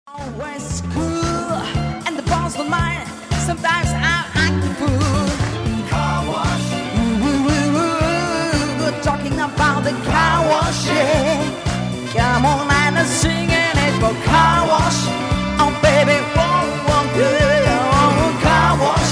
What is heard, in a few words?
LIVE